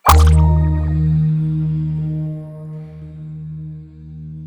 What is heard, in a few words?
Jungle Bounce Wall